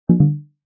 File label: no more hints